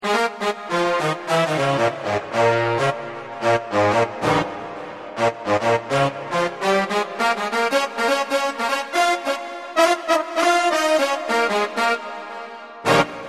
BRASS EXS

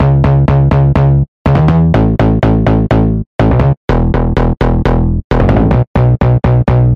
BASS DEEP

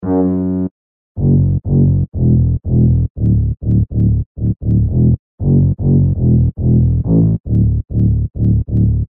BIG ROOM BASS

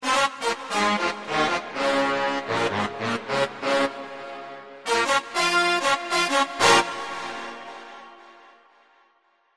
R&R BRASS

